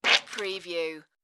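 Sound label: Spitting Liquid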